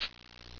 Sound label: CABASA